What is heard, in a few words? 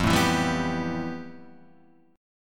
F Major Sharp 11th; strummed; JGuitar